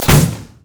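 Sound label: minefield explosion; LoL; ziggs madscientist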